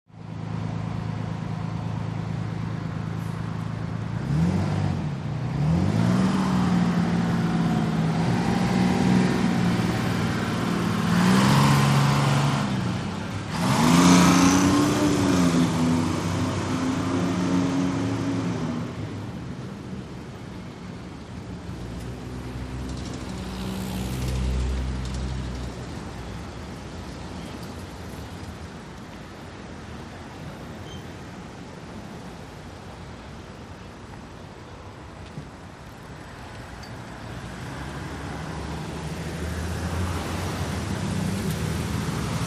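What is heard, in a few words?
City Background Traffic; big city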